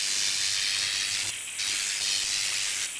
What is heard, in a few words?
green idle, weapons; cso; trinity